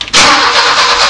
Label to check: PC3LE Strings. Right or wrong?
Wrong